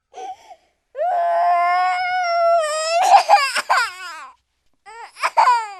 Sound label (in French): pleurs enfants